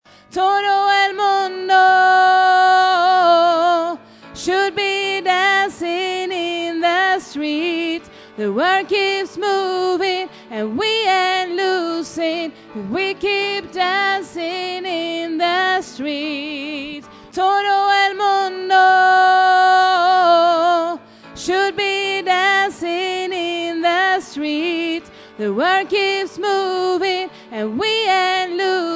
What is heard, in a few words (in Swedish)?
sopran